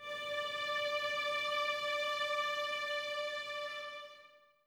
violins D 5, String Ensembles, 23 Violins; Miroslav Vitous

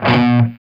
C 4, Soldano rock guitar; Spectrasonics; Hans Zimmer Guitars VOL-1

